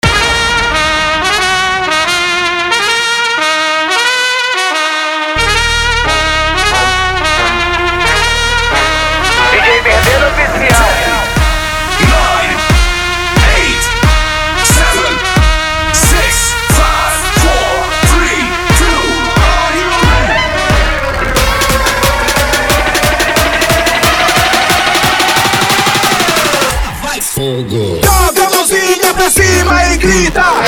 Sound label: INTRO SAX; Tecnomelody 2025